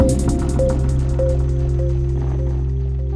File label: Water Pad; BB 2HTC Samples Vol 4; Weird